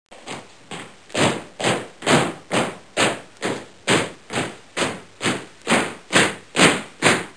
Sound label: Marching Men; Soundsation; Human